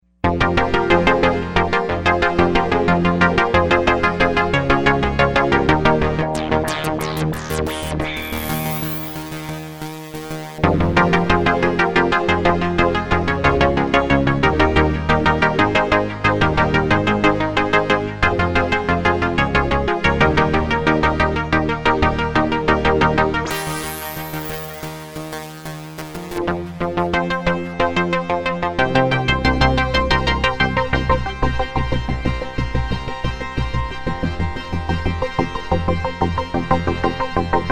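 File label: SEQ Oriental Illusion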